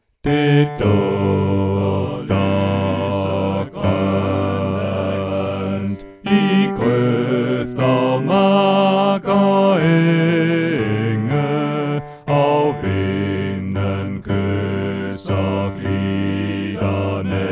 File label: Bas2; Sommersalme; oevefiler